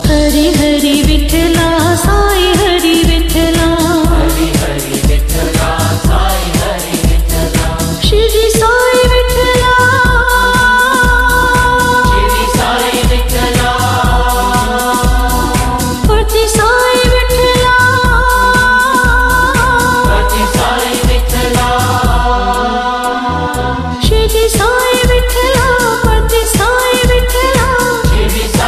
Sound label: ringtone; shirdi sai